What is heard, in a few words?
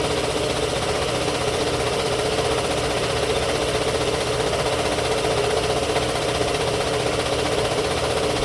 Air Compressor Loop